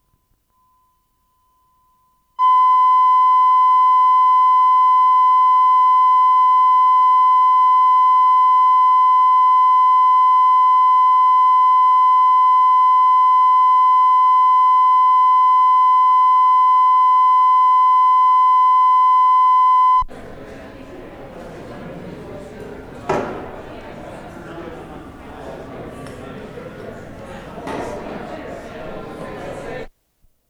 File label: tone, chorale